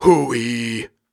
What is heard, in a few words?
Grunts and Chants, Viking Vocal